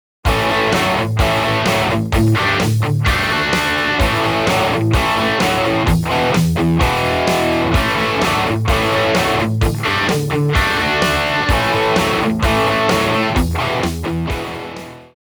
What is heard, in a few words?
Crunch Drums